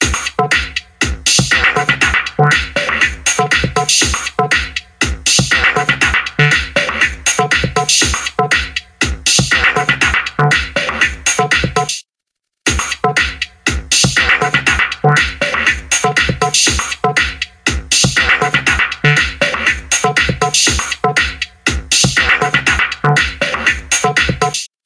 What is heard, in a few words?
Groove, ringtones; LG; P940 Prada 3.0